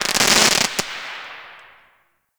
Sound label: Firework Crackle; Warframe; Fireworks